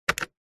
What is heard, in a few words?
foley cash register electronic large button single press